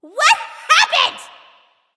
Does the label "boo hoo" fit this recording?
Yes